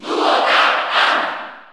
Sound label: Cheer Italian; Duck Hunt; SSBU